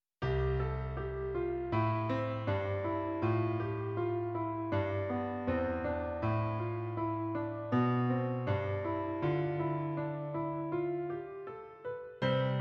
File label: Piano